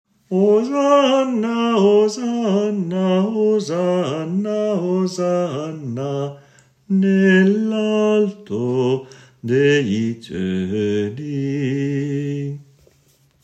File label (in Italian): Coda polifonica Bassi; Santo Liberto; coro diocesano